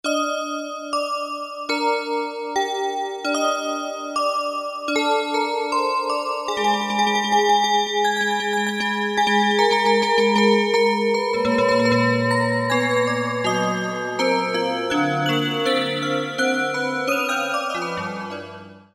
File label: Bells